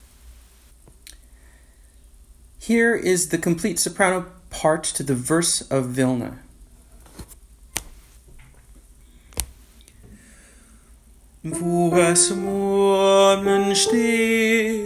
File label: Verse Soprano Part; Vilne